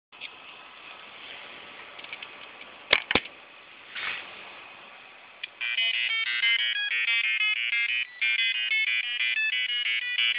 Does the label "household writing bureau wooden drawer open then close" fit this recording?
No